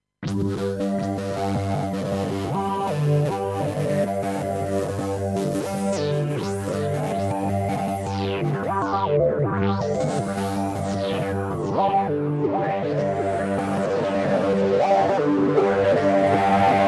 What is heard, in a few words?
Distortion Rise